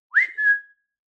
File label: whistle